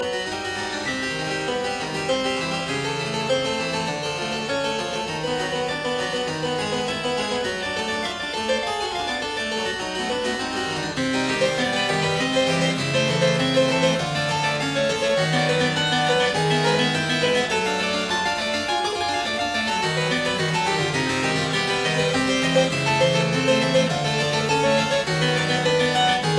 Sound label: version for keyboard, Ostinato; Cowell; Set of 4